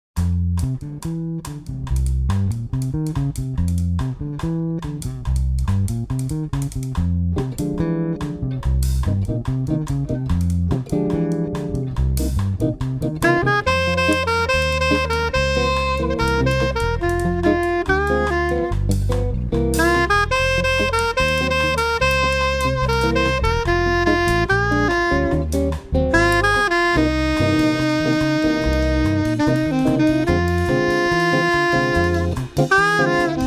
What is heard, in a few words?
quartet, latin ensemble